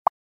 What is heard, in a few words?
apps update notify